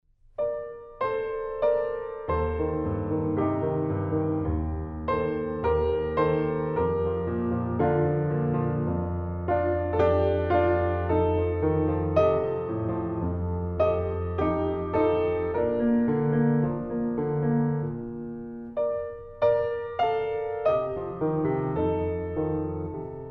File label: piano; Obras para piano; Pedro Camin; Velho Pinho; Toada